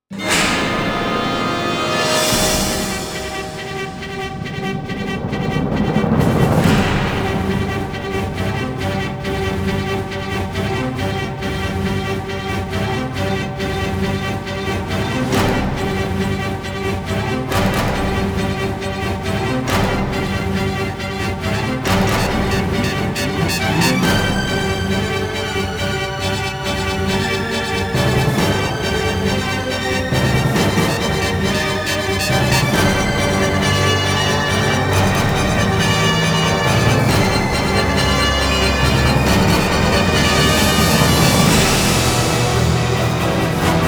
action; X2 Wolverine's Revenge; XBOX

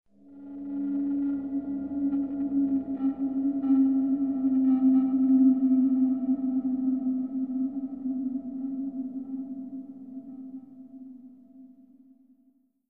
stinger; Myst III Exile

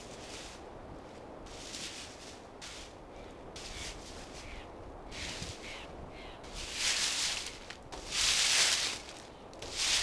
grass, NATURE; Pirates Of The Caribbean

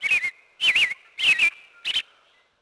bird; Tony Hawk's Project 8; XBOX

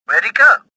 escaping; worker1

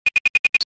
ping, turret

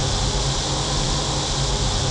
Spaceship Landing Hiss

